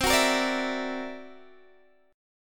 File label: C 9th Flat 5th, Guitar DADGAD, chord